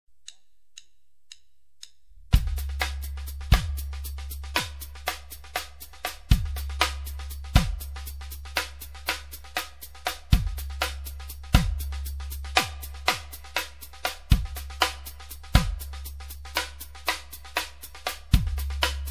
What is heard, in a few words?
junglesteps